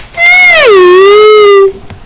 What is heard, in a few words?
eugheugheugh